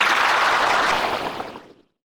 kids game lose evaporate liquid bubbles water waves; washing shrink